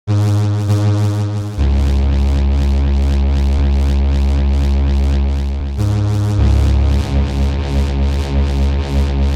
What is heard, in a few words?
bass